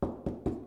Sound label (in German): Klopfen